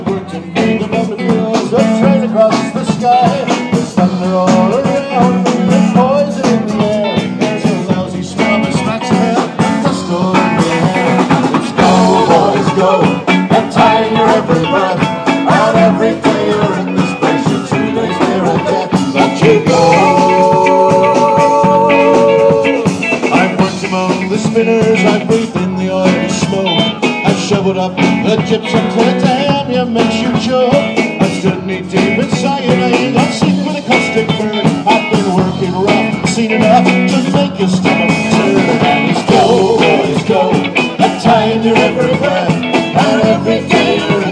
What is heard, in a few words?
live music, bristol harbour festival